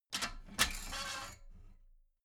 metal ladder small handle unfold soft

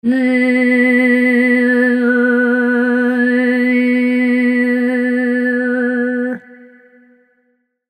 girl sings strange

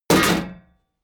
shield metal impact clatter

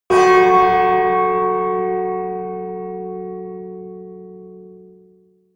melodic church bell

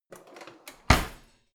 old drawer creaky slide in hard

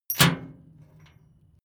metal chain impact container

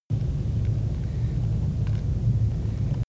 low noise crumbling

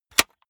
cassette case close hard

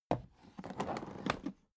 alu case small handle

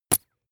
bath duck hit

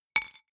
key ring small drop stone

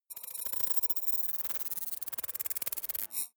glitch transmission

